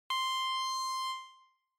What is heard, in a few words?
Countdown